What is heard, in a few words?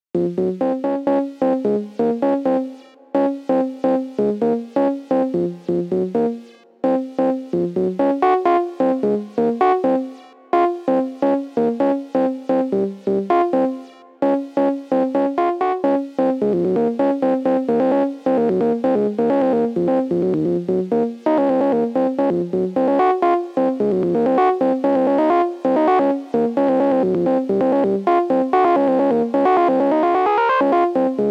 Melody; chippy